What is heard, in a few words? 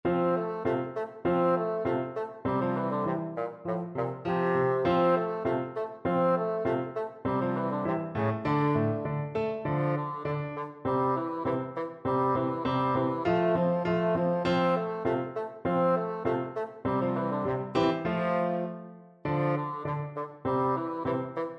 Turkish March, bassoon